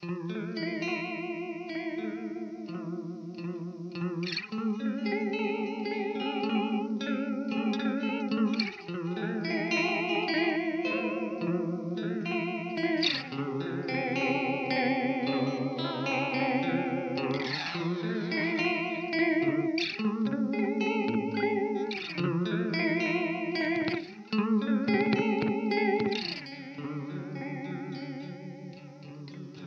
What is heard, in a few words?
Teisco Delay Modulation